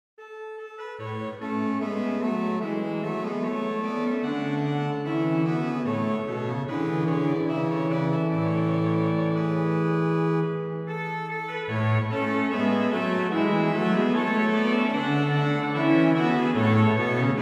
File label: Allegro Grand Choeur, OrganMusic; Rentrée; Boely; Souvigny